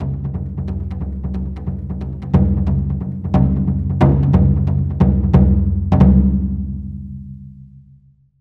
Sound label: Okedo